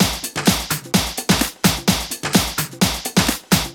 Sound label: Houseloops 128BPM; Vengeance Samples